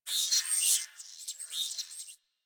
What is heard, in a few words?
Venus Corpus Data; Ambience; Warframe; Venus Open World; Venus Landscape